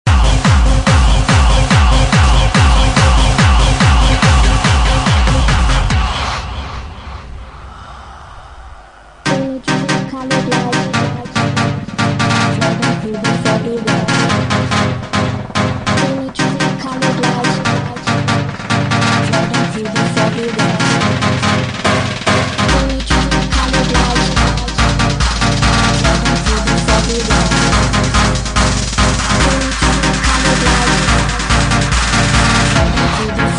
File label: megabass